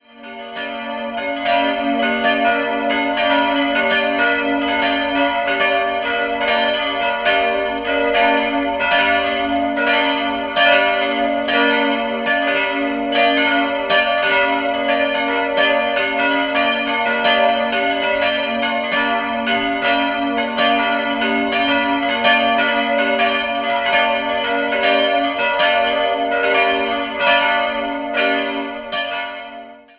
Herrngiersdorf, Glocken

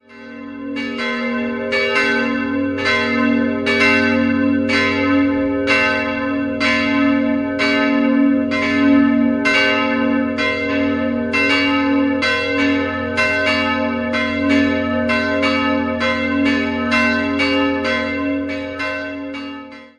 Bitz, glocken

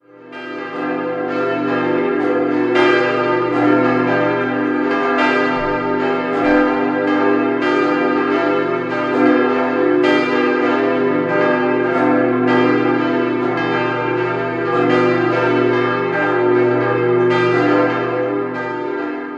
Mamming, Glocken

